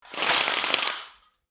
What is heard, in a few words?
Cordless blind raise up